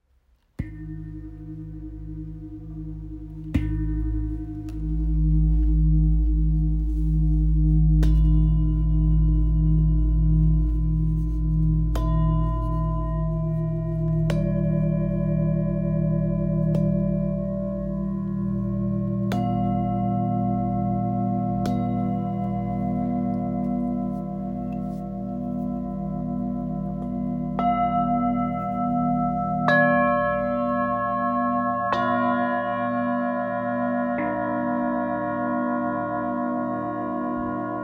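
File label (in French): Set prestige 4 bols, bol chantant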